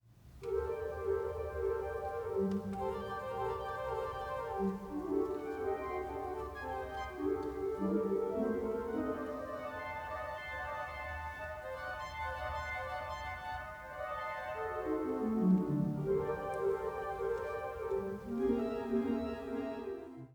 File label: organs; Bossi